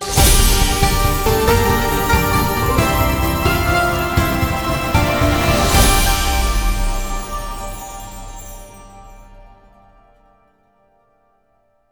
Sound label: big Win; netent